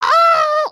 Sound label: need to pee again